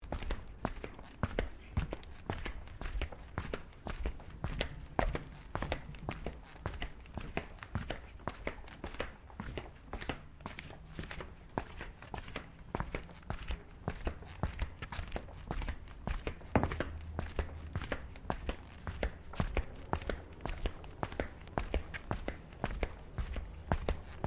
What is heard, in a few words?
FOOTSTEPS THONGS (FLIPFLOPS) TILES SEQUENCE; Footstep Foley; Footsteps (Sound Effects:Human)